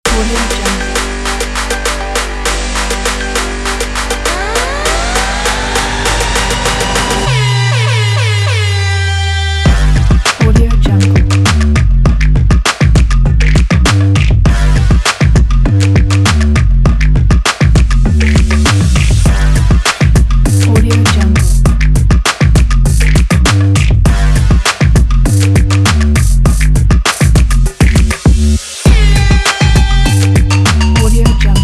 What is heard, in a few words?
rap